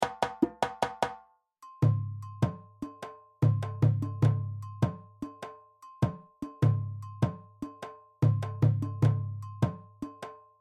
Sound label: Sangba + Djembe; Djembe 1